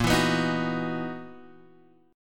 Gb 13th Bb, guitar

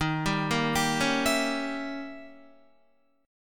Eb 7th Flat 9th, Eb, Guitar; Eb7b9 arpeggiated; JGuitar

